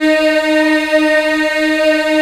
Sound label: D#3 WOM EH, Choir; USB Soundscan vol.28; Acoustic & Synth; AKAI